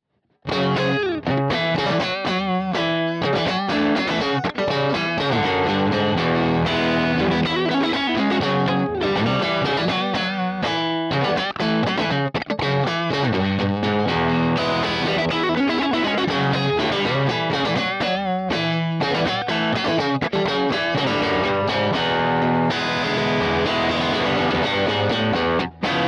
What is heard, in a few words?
Strat